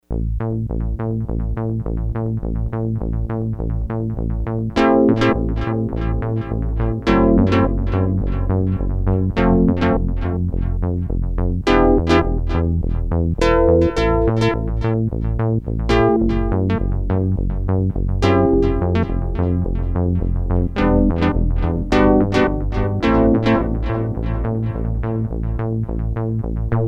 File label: '80s with delay, Prophet-5; Sequential Circuits Prophet-5 (Ver.3)